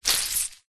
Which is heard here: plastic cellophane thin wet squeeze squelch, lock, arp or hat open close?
plastic cellophane thin wet squeeze squelch